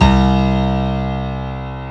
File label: piano